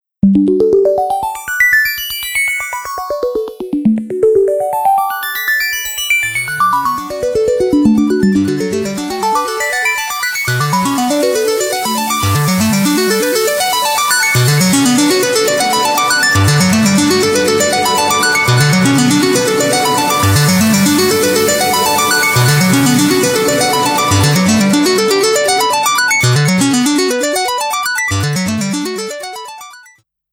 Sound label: Sparkle dust; Nokia; Oro Belle